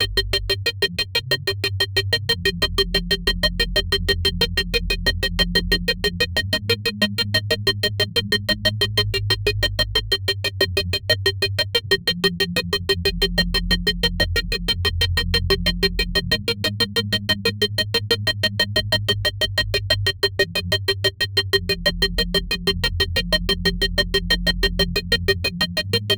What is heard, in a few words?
seamless loop 92, plucks